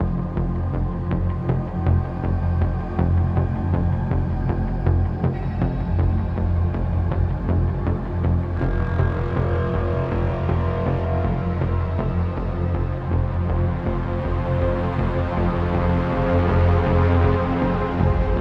Augmentation Loop; Deus Ex Mankind Divided; Koller

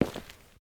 Footsteps, Human, Tile, Walk; Backrooms 2D

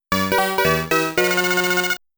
Extend Music; mappy

